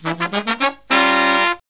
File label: Killer Brass Riffs, 108 soul